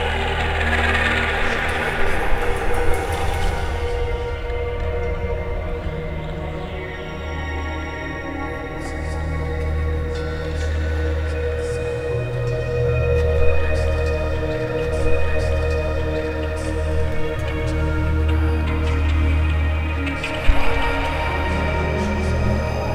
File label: Synvoxperc Scape; Sonic Foundry (Sony Creative Software); Ma Ja Le; Soundworlds